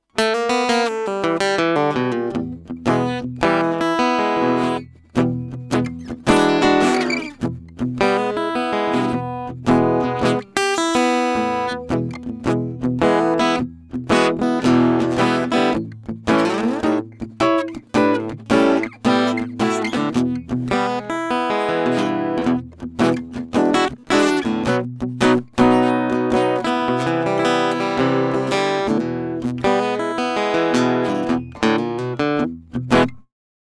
Blues Skill EQ Flat

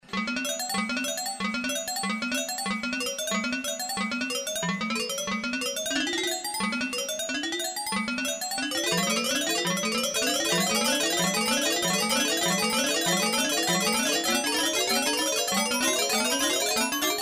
delayed OPs

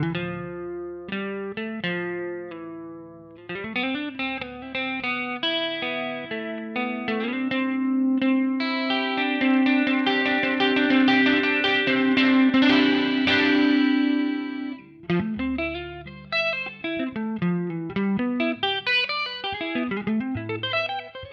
Neural DSP Quad Cortex Roland Jazz Chorus

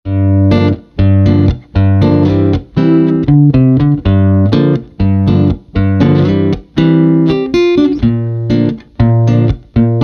Walden T550 no Treble

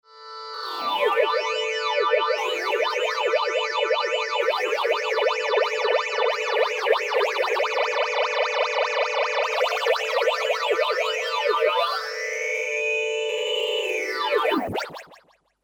Juno MX200

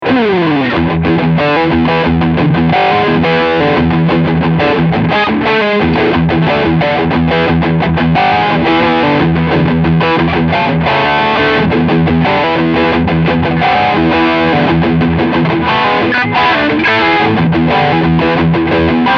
AMP Boost Overdrive

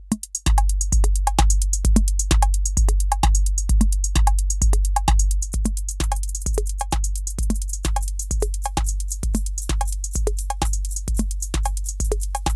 Erica Synths Black Hole DSP2 HP Freezer

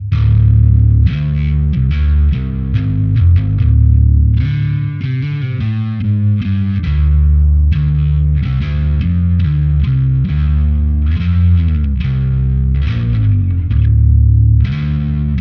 Distortion Deep Cabsim

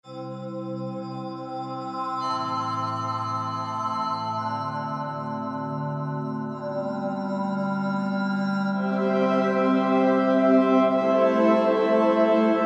chromaphone pad; aas

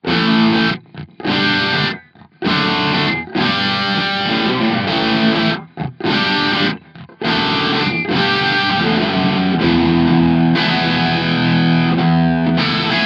Crunch Rhythm; Gitarren-Plug-ins im Vergleich; Genome

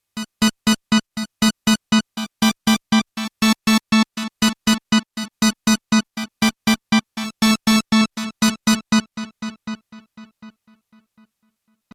Oldschool Lead; Pro-12

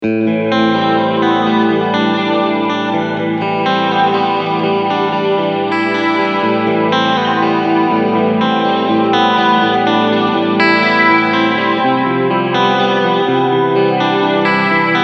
Short Reverb Modulated

